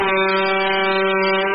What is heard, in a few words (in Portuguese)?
vuvuzela estourado